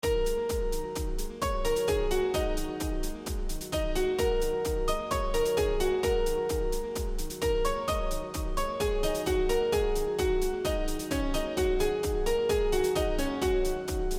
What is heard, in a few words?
Piano Test